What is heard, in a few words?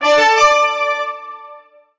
Highscore